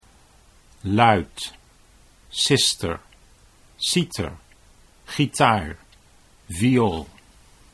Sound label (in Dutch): luitcister